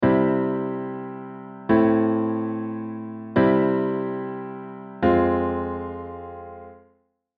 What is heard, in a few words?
Chromatic Alterations